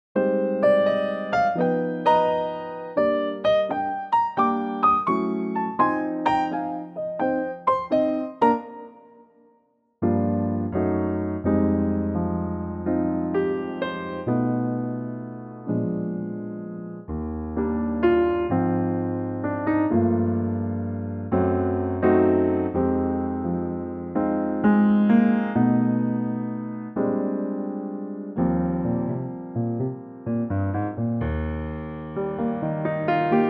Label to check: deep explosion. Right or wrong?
Wrong